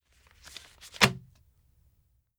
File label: Magazine Throw; Fairlight Sound Lib